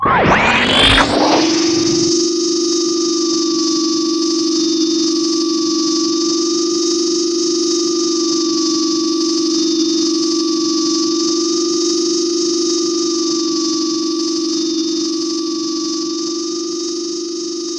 Enemy Jet Active Charge Loop; gamerip; disney star smash; android

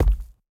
Bounce Stone; Going Under

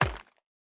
Bounce Furniture

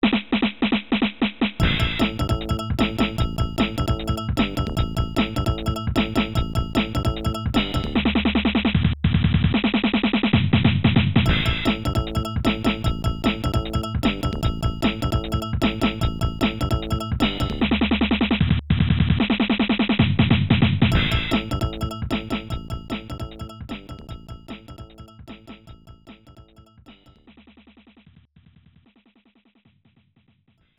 Rotation

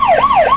Car - Police

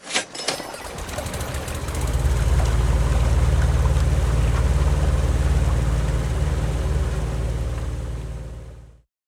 Motor Power Up; Going Under; Hustlebonez Founder

